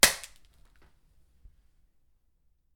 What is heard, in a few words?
Egg Drop